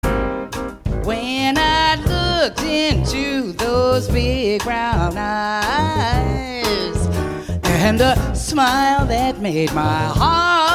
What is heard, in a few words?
oohwee